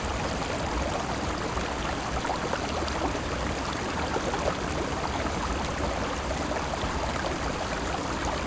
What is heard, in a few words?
loop water